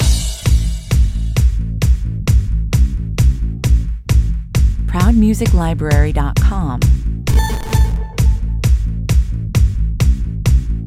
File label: retro video game (underscore version)